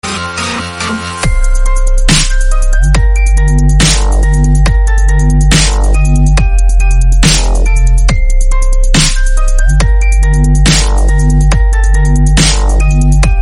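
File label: electro bounce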